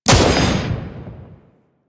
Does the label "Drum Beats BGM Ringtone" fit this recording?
No